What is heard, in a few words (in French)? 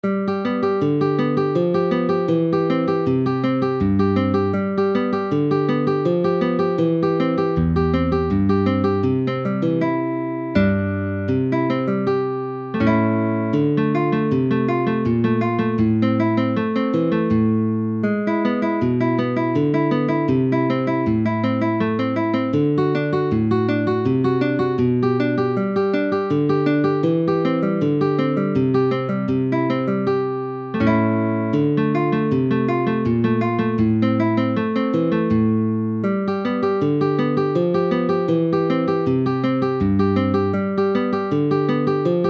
vive valse